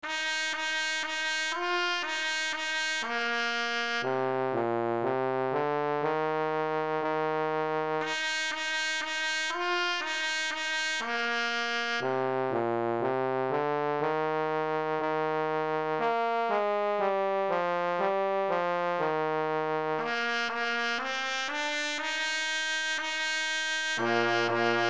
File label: CHRISTMAS for Trumpet and Trombone